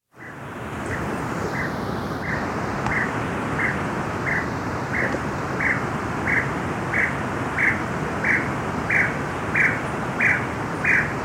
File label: tawny-breasted tinamou